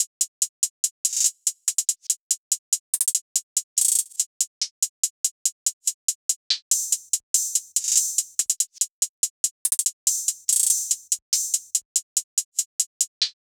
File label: Hihat Loop 143 BPM; Drama; Cymatics; Fiji Hihat Loops & Percussion